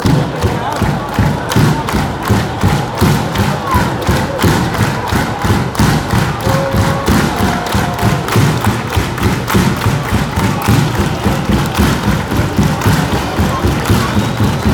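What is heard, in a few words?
clapping and stamping, football songs and soccer chants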